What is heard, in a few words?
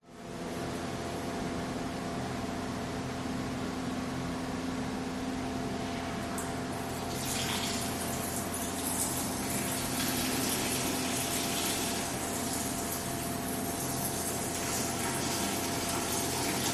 public toilet fan person man urinates next cubicle flushes toilet; public places